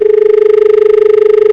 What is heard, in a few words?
dialtone